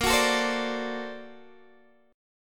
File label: A sharp Augmented Major 9th, Guitar DADGAD